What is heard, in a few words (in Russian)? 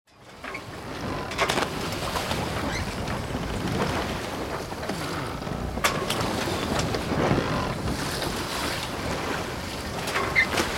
skrip rybolovnogo sudna